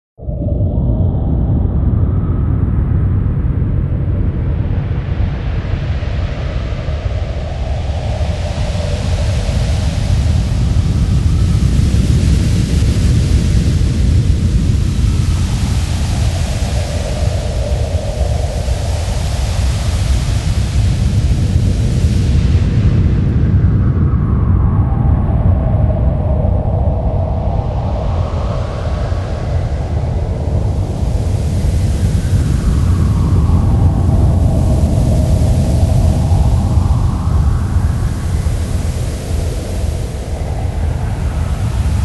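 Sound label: desert sounds; zvuki pustyni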